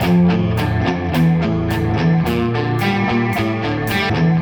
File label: guitar, Sad